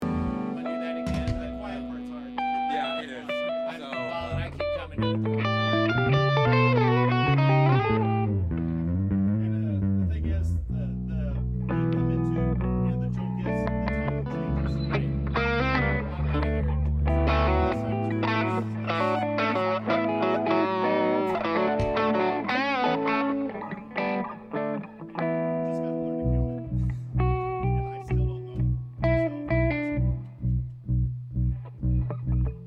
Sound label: Strings Only